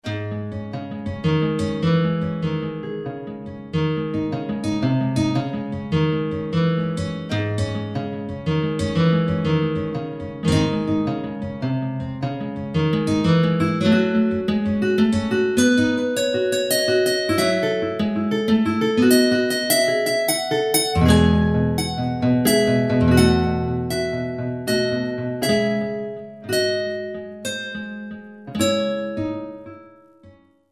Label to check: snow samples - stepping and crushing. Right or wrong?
Wrong